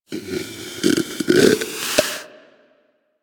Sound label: Predator Snore, Hunting Critters; Warframe